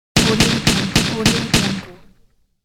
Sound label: revolver pistol gun shooting